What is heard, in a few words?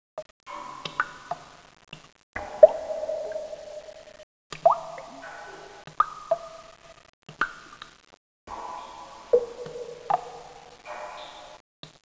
drop